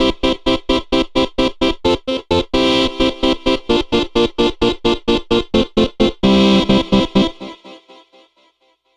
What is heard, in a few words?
Distorted Farfisa; Dr. Fink's Funk Factory